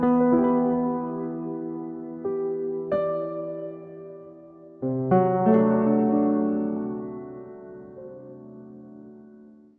Cinematic emotional, Dramatic,Epic & Emotional Soundscapes; Cinematic Loops Pack; dramatic ambience, epic trailer